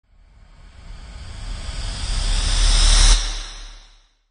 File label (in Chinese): 上升音效; 快切音效